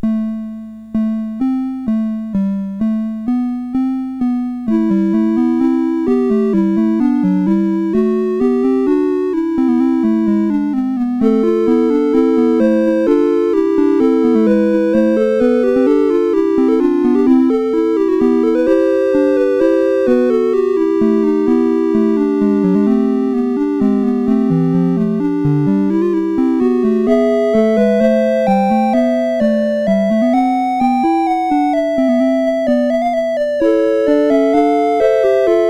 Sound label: Choral Prelude, CSG8580R5